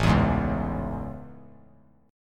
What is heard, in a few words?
A Major 7th A; AM7; strummed; JGuitar